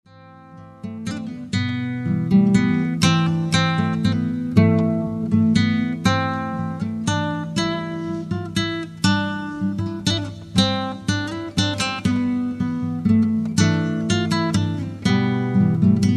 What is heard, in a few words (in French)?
guit classique; à la guitare acoustique; film médiéval sérieux de Cheap Movies; thème de l'héroïne Nalie